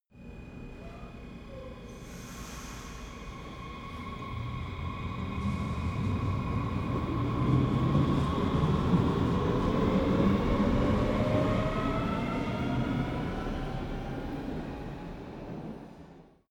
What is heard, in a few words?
train underground station depart